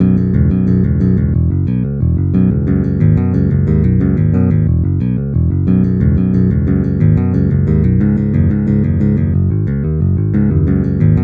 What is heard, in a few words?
bass